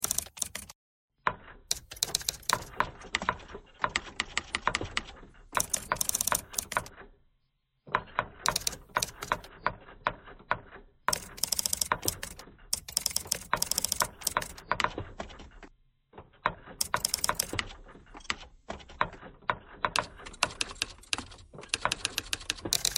typewriter thread simple

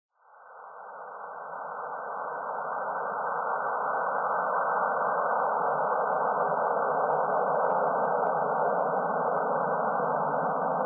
surf noise

